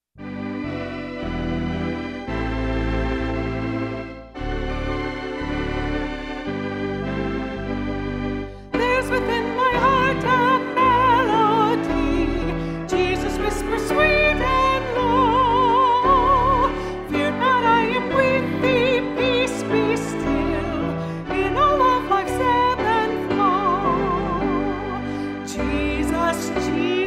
karaoke mix; He Keeps Me Singing

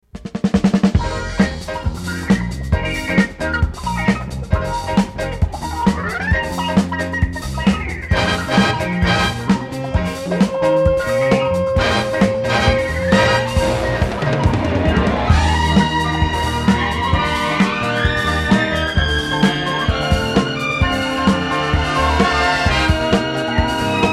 Disco (no vocals), Stereo Band Track

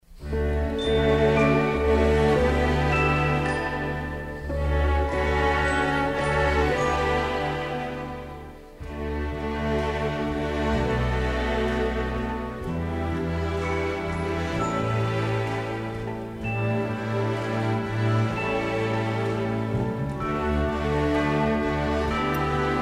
band only; Oral Roberts; Prayer Tower

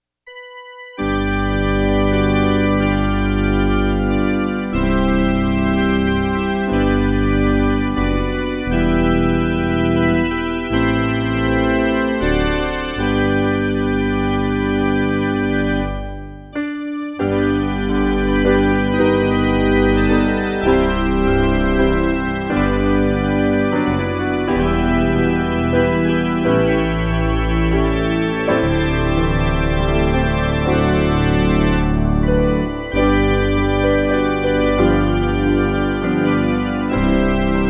instrumental reference mix; Amazing Grace

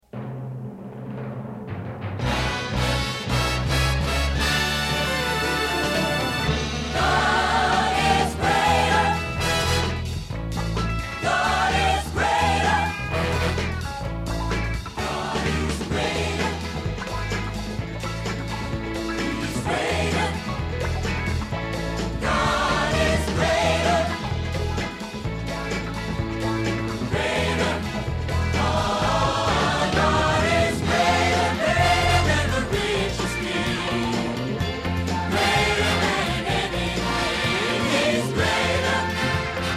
Band&Vocal Track

